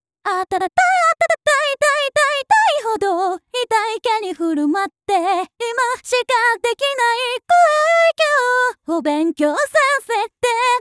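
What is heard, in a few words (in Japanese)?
Synthesizer V, サビ, 「Powerful」を最大値まで上げたバージョン, 歌声; ボーカルスタイル; Synthesizer V Studio 2 Pro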